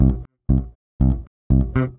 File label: bass loop; ACID MUSIC